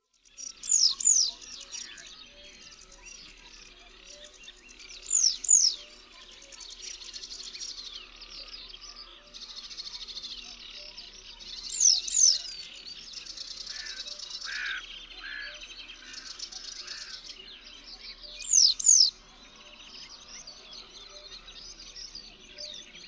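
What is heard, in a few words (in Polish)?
pliszka żółta; birdsounds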